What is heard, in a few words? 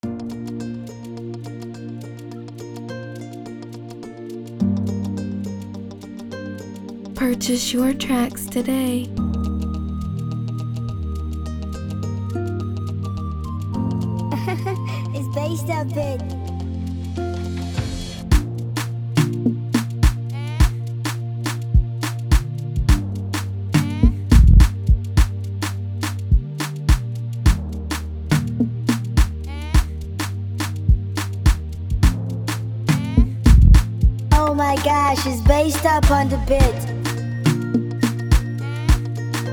Instrumental